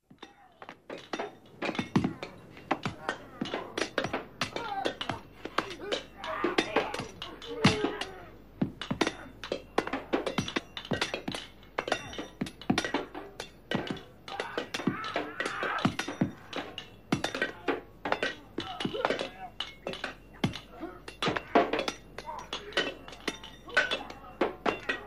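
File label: sword fight with voices; Звук мечей; Звуки сражений на мечах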